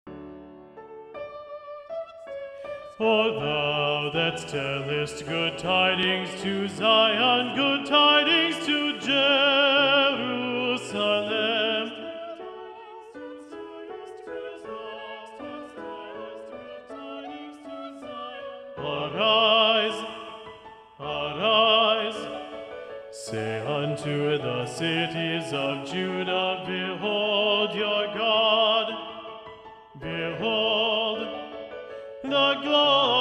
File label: Bass Predominant; O Thou That Tellest; Messiah; Handel